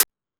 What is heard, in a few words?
Techno G, Drum Machines, EMU XL-7